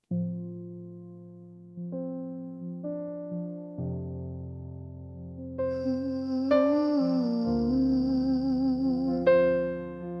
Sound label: winifred lullaby